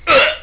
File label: ouch; xbomber